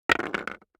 DICE on Hard Wood, Throw and Roll, Metal, 2 Two Dice; Samples; ArticulatedSounds; DICE Sfx Party (Extended)